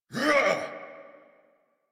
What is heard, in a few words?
Exert; en; Warframe; New War; Kahl